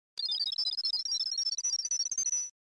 sound effects spell cure; final fantasy vii

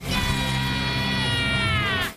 yeeeaahhh

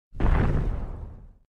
armored titan footstep

